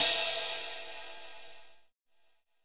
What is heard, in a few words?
HiHat